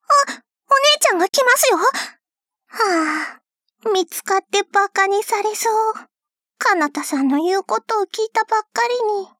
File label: alto